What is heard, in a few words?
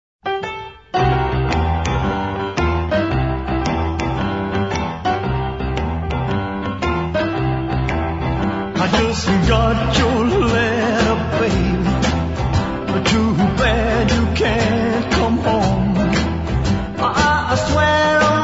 blues